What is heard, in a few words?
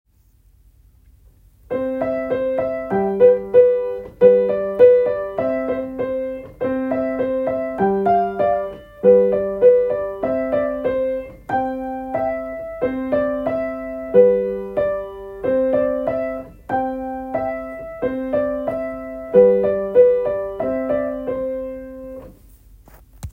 Almost spring, piano